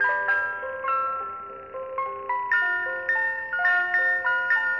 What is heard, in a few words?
Music Ice Cream Truck Loop; SFX Kit; Foley - Misc